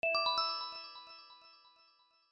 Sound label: Chime